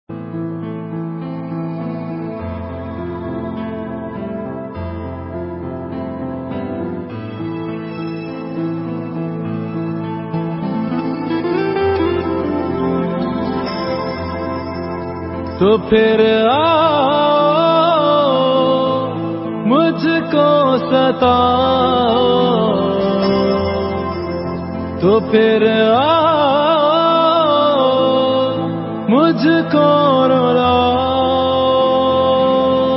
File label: Lounge Version